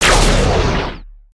Spider Boss Fire Shot; creatures mechanical

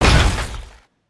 Spider Boss On Hit; creatures mechanical

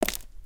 Footstep Stone Outside; creatures pets

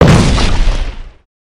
rock spawn; creatures organic

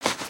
cloth; creatures organic

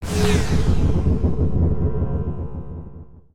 Spiderling Charge Up; creatures mechanical; Valiant

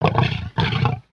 Pets Triceratops Happ; creatures pets; Lego

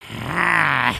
Attack Idle, creature; creatures organic

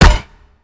Spider Get Hit

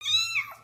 Kitten Meow; creatures organic

